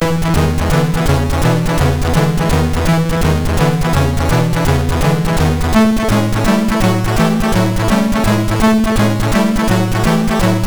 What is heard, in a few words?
Rock n Roll sid MOS6581R4